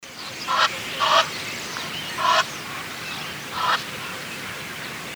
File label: egygoo